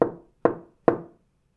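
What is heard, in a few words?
knock on wooden pillar; knocking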